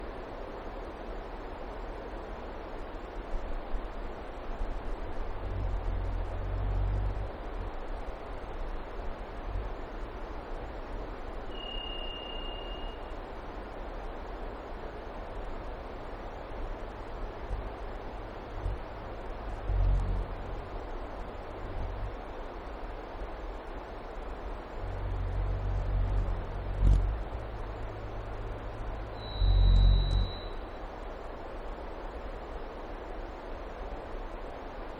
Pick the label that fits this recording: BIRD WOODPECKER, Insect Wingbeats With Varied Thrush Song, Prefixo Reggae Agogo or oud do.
Insect Wingbeats With Varied Thrush Song